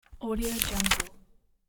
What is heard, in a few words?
paper sound